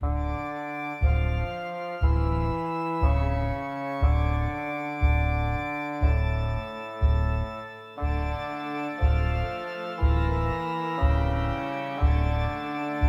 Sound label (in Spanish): music castillo Vampiro; lootlite